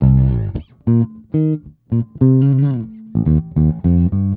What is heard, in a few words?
110 Pop 70s Bass Cm, E-Bass; Pop Rock